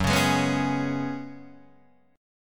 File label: Gb Suspended 2nd Suspended 4th, Guitar DADGAD